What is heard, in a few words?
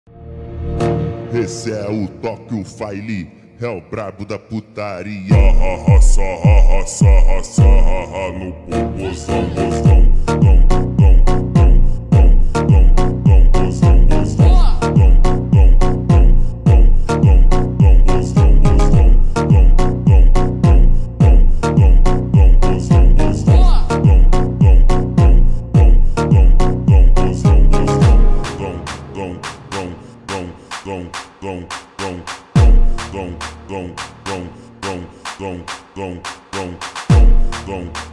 Phonk Montagem